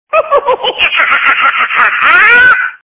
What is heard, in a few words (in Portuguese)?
Fantasma Liga; toques engracados